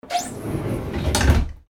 door sliding close

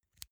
insert xlr extension; objekte; elektronik

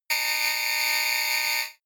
glitch electronics short, sciene fiction

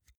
pull out xlr extension heavy; objekte; elektronik

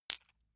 medium metal impact hard

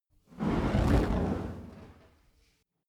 fire torch whoosh long soft; swooshes-swishes

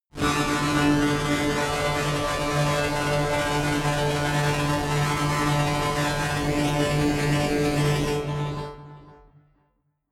cinematic resonator string

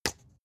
spice jar smack open low; industrie wirtschaft; gastronomie

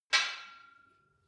barbell weight rattle medium, fitnessstudio; sport freizeit

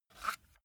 cassette case take out soft; objekte; kassette

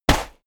step walk sand; schritte

